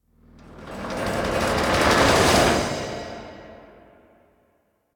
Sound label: Railjack Launch Detach Build Up; Warframe; Rail Jack Dock